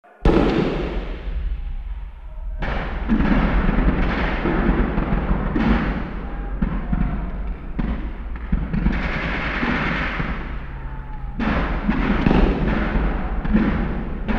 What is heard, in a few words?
Amb Battle Int